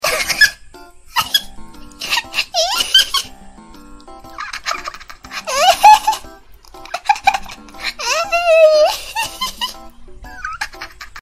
laugh

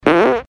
fart

